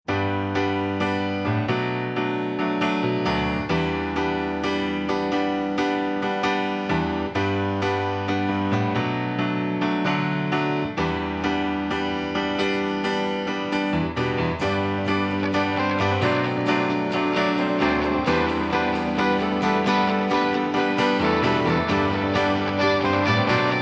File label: Sunshine Instrumental; PM USA